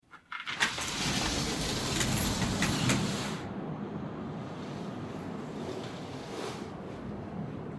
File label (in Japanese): 錆びれた窓を開ける音; window